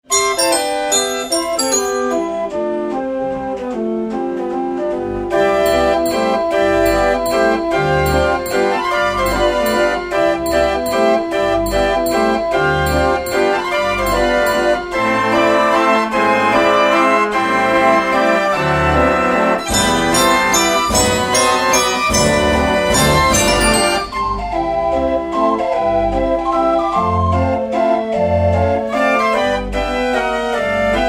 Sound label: New England Band Organ Christmas